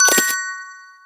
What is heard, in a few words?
buy Sound; BattleBuddies